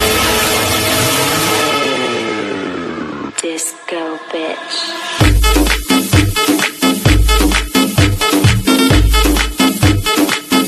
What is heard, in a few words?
Tribal Dance